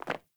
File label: Sandals Land Default, Footsteps; Characters; Player